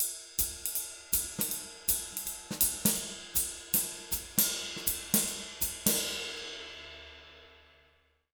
160 SWING, Jazz Latin Drumloops; USB Soundscan vol.08; AKAI

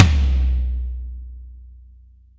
tom 16

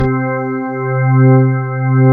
ALL STOP, CHURCHY

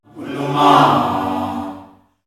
UNA MAAH, CHOIRS; Spectrasonics; Heart of Africa

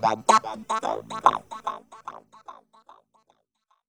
BEAT FX, Funky Vocals; USB Soundscan vol.18; AKAI; TB BEAT

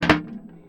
Percussive Hit, contact mic

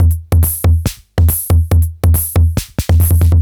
retro drum machine Loops 140bpm; Copicat; SR88

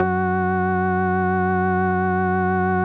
F#2, ORGANS; Music Store Sound Service; Einstein

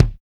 BUSY KICK; NATUR. K1

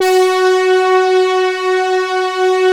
BRASS, PADS; Masterbits; Vintage Voltage

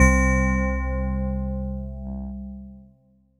VS BELLISH; Synclavier Sampler Library; KEYS AND GUITARS

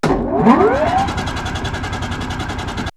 Grind'n'Go; X-Static Goldmine

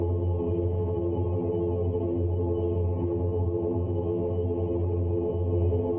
Tonal Re Draw, sparse soundscape

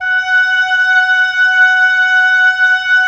WND OB F, Oboe f; Roland - LA Composer vol. 4

